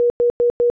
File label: pip tone; Samsung SGH-i740; System Sounds